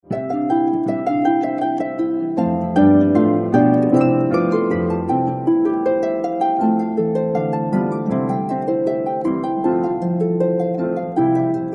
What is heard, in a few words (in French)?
Rigaudon